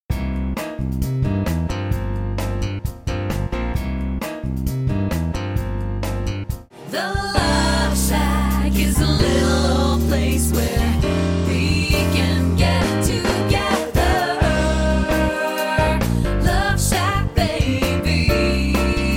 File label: vocal alto middle